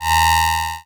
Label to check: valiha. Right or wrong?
Wrong